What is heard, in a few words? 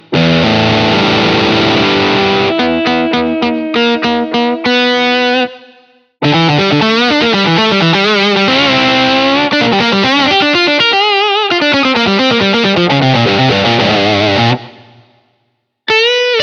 Electri6ity Tele Blues Riffs